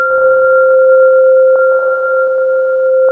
ringing C3

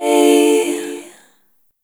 Chord D Minor, Vocal Samples; Sound Design; Sonic Foundry (Sony Creative Software); Pocket Diva World Class Vocal Samples

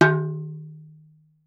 big funky, cowbells; Voice Crystal - Steve Reid Definitive Percussion

